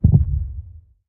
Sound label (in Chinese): 心跳声; 监守者